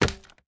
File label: wooden snap attack; mobile game action; esmteam